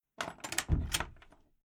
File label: Household Old House Large Door Latched And Closed Metal Rattle Eerie; Creaky Door Sound Effects